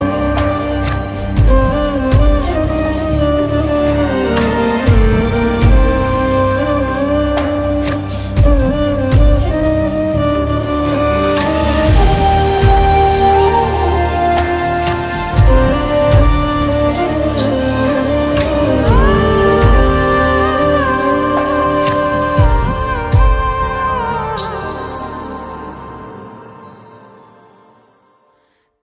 Instrumental

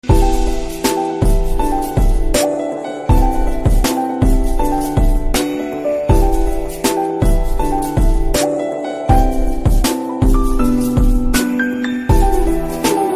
BGM Piano

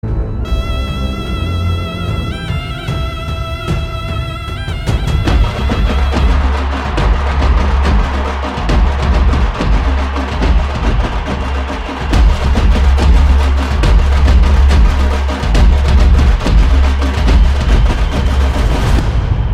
Mass BGM; Dhanush; Karnan Teaser

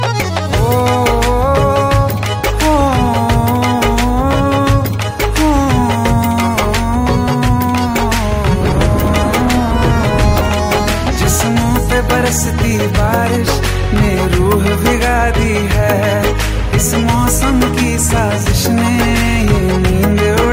New Song

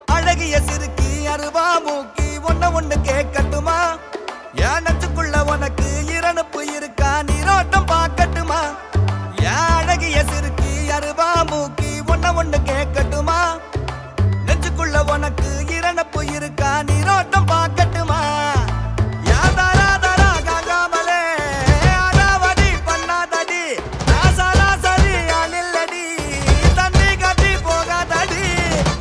Ringtones BGM, Tamil; Ka Pae Ranasingam

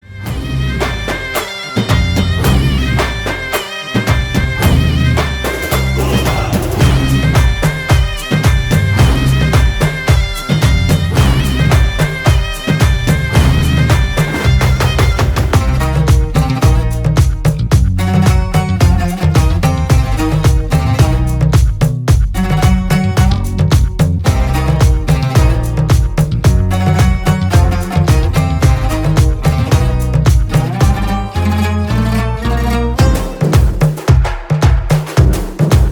Instrumental Ringtone

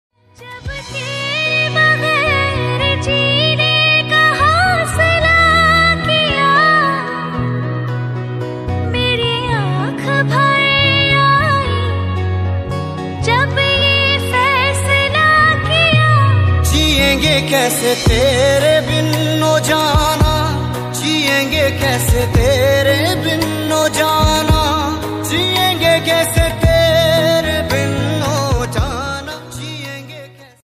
Female

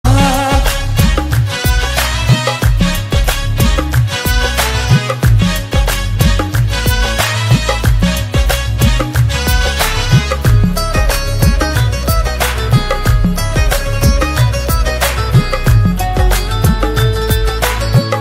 instrumental

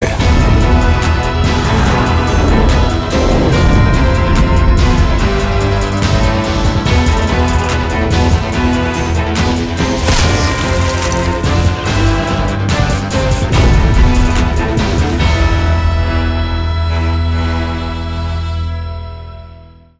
Ringtone BGM; Pathaan; Shah Rukh Khan

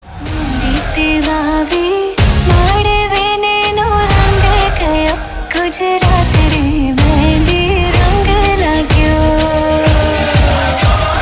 Dhol Mix Ringtone

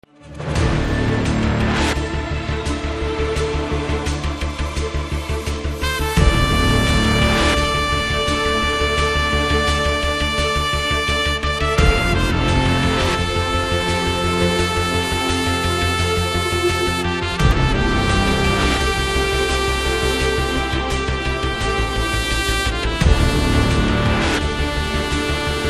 BGM; Thalapathy 65 Announcement

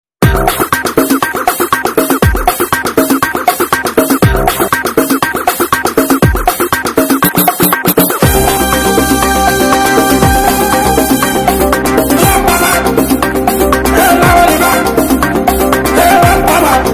Telugu Ringtones and BGM; Disco Raja

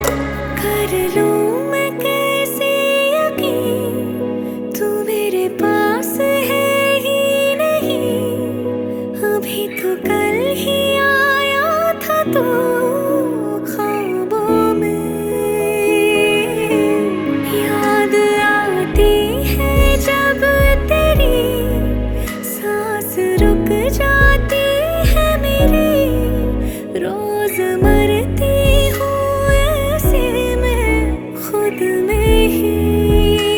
Duet

